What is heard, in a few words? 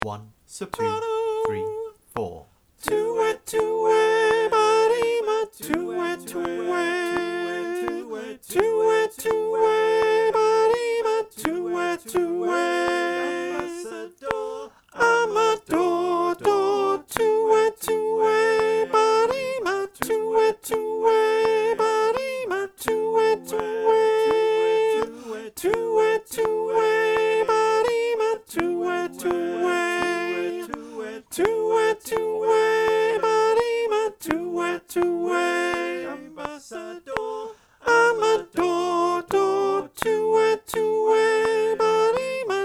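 soprano click, choir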